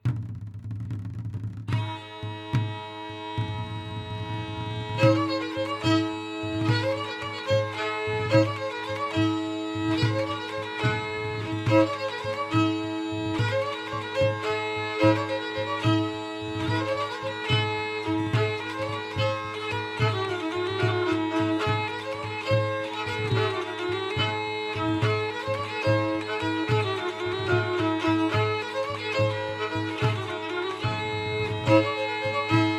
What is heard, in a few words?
Tavern; Assassin's Creed Unity